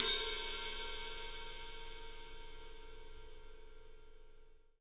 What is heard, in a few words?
ride cymbal; 3D Sound; 3D Drums